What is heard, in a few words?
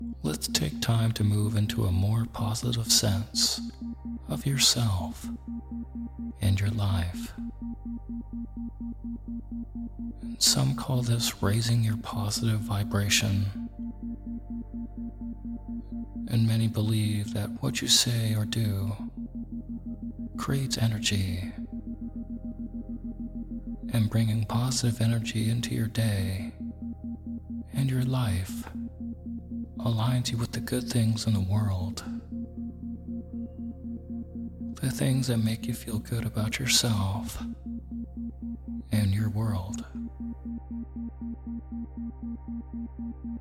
Positive Energy With Isochronic Tones